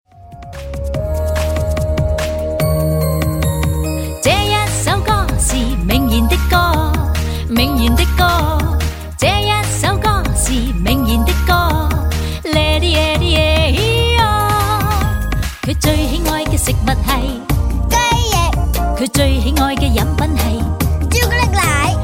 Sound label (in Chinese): 無對白, 正向心理兒歌